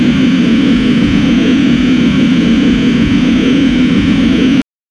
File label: strong rocket engine sound